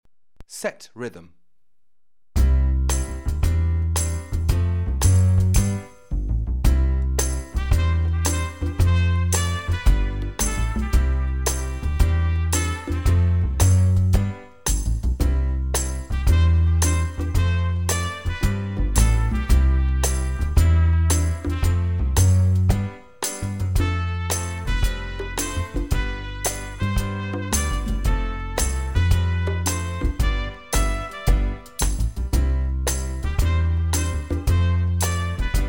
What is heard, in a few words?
Set Rhythm